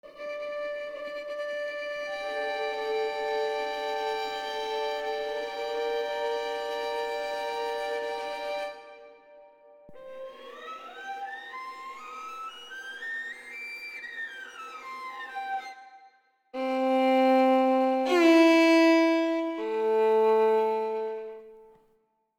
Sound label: special patches, harmonic subtones emotional violin